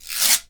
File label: guiro, Synclavier Percussion, World & Orchestral